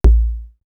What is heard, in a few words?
ANALOGUE; East West; Global Sounds; D4 FXS